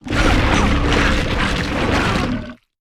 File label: creature spikeytrap eat